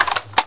deploy, weapons; svencoop

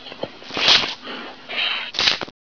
raptor bite chunk

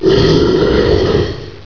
die, gator; gearbox

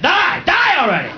die

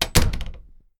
door close; svencoop

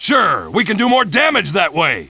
damage; fgrunt

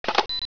drop mine; gearbox; demoman

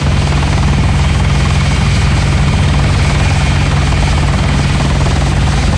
rotor, apache; svencoop; paranoia

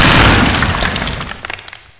gunner boom; gearbox

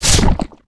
spit; weapons

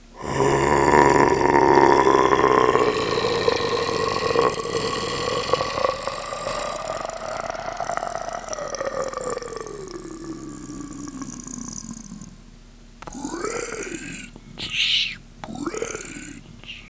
demon

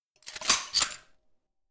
sniper reload, weapons; svencoop

